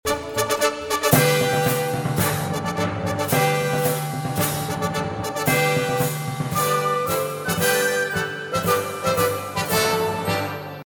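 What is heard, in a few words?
fanfare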